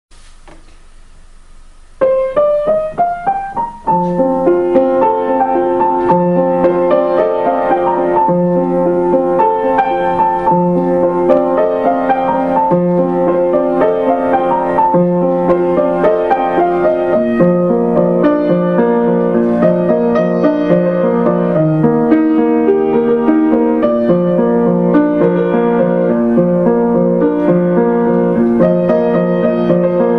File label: piano cover